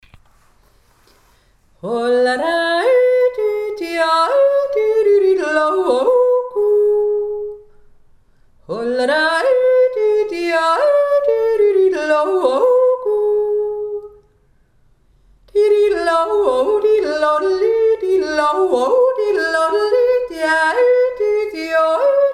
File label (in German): hermanns jodler